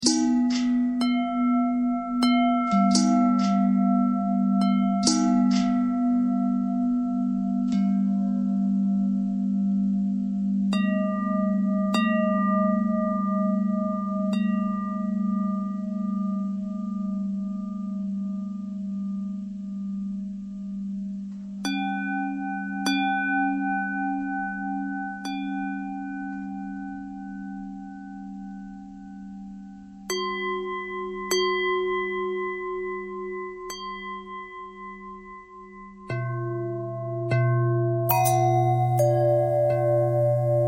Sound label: bell long bell thread pentatonic